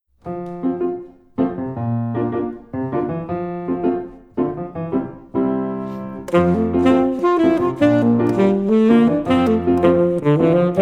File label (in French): Sax, Danza latina, saxophone; Escapade musicale saxophone